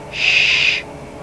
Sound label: SHHHH